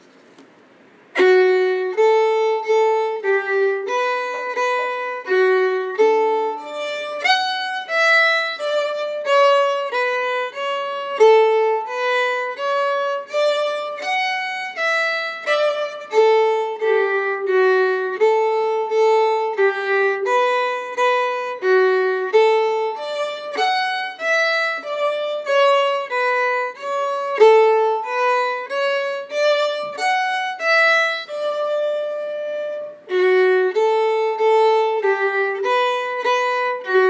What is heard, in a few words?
Tripping Upstairs Slow